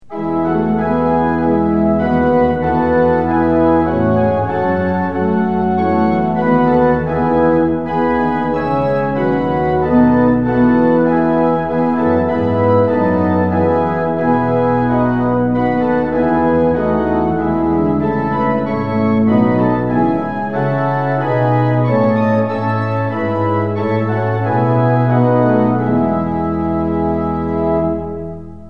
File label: tune; hymns; lillenas